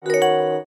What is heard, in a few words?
jingle